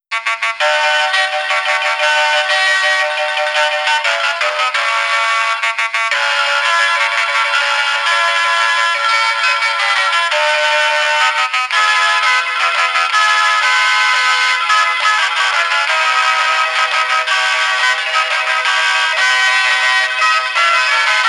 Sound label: specialty horns; stereo